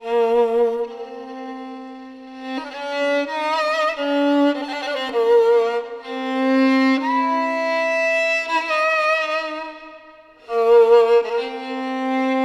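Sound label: violin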